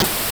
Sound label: c64 sid snare